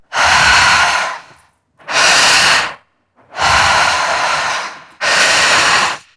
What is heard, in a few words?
Breath Heavy Slow Loop; New War